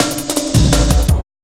Bouncy Cut, Jungle, Drum Loops 166.5; Zero-G Jungle Warfare 1, 2 & 3

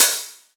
Hat; M8; Electronisounds; Enigma Dub Cube One Shots

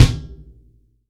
TUBE KICK

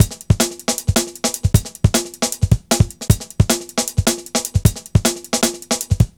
155, breaks, Loops

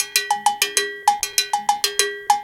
Cow Bell, Latin Percussion

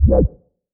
C Bass, DnB One Shots

